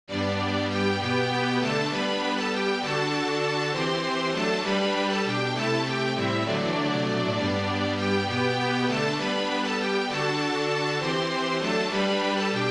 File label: Alleluia Quartet; MIDI Demo; Celtic